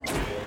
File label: pick up module